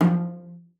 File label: CELLO FINGER F3; Xsample VOL-5